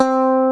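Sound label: electric guitar mute C3; AKAI S-Series CD-ROM Sound Library VOL-8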